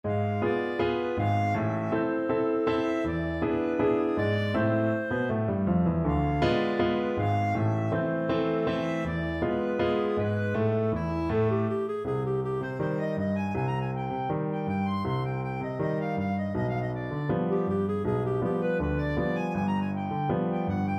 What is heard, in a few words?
el choclo, clarinet